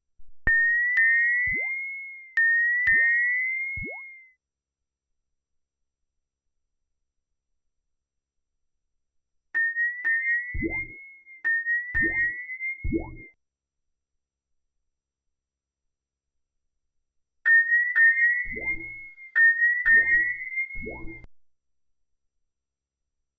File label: whale synthesis